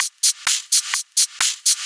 Hihat Loop 128 BPM

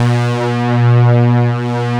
VFX SYNT, Synthesizers; Soli-Music; World of Synthesizers VOL-1

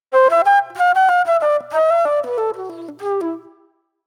Mellow Flute

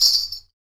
METAL, Afro Shakers, Ankle Pods

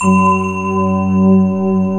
D50 Layer, Roland

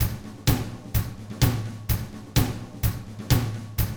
127 Drums

